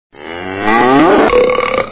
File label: cow burp